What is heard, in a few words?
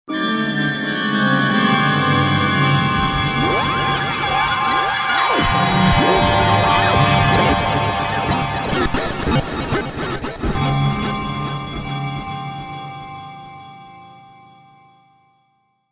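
Modern Cluster, V-Synth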